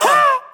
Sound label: ha, ooh ahh